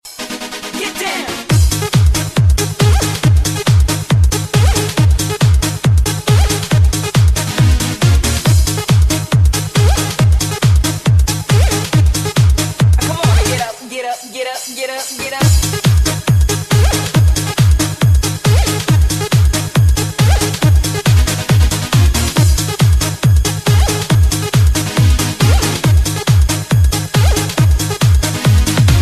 Techno Sms

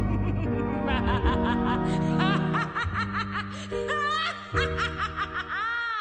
Nightmare Laughter

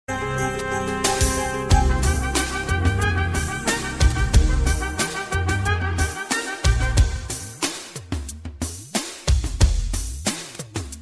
Melody

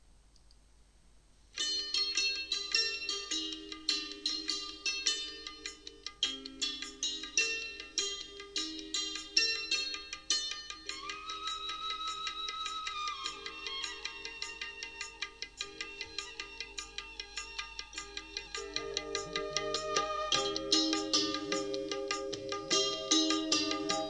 Morning Raga Flute

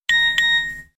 Msg Ring; Nokia

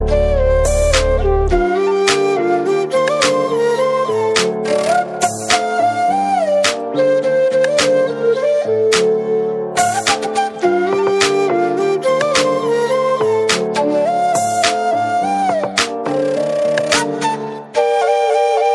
cute flute

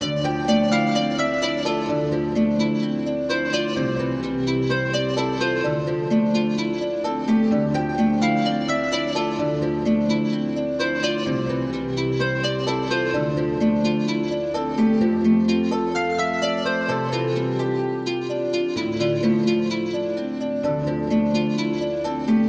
Romantic Love Tune

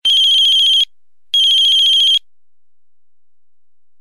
Cell

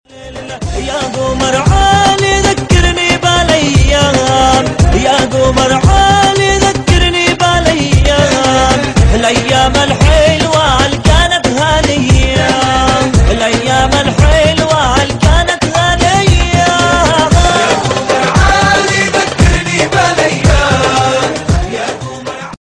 Arabic Sms Ringtone

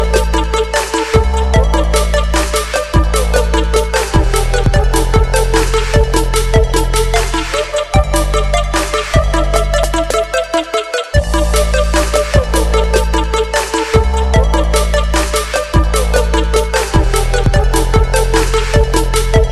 Drum pad

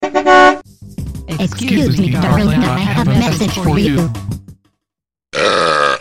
Sweet Msg Tone

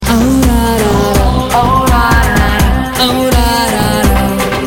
bgm; Vettaikaran; meloboom

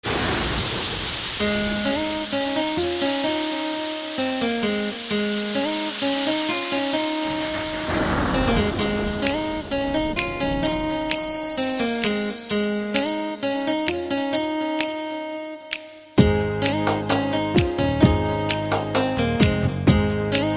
Rain Guitar

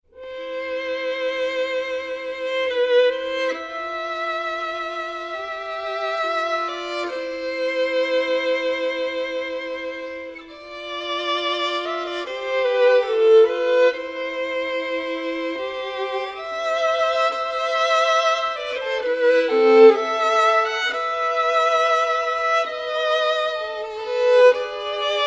Ave Maria Violin

